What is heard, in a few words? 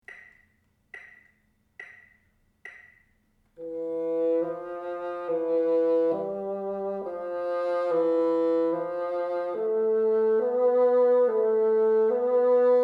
teacher, bassoon